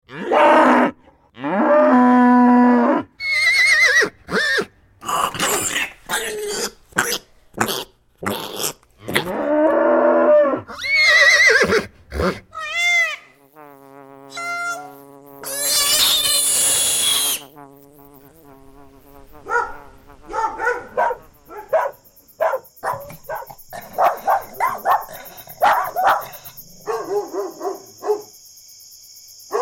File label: Animals; Big Room Sound